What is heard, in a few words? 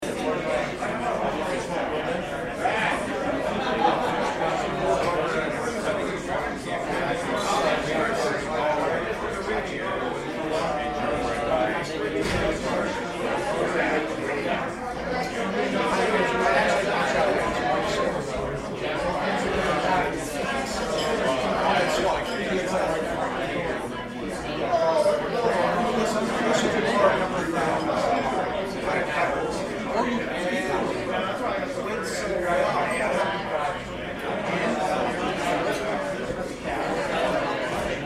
Busy crowd voices background chatter; city; Urban, Town